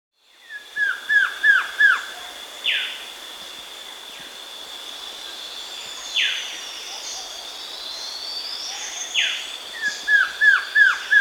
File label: Masked Trogon (Trogon Personatus), Andean Birds; S. America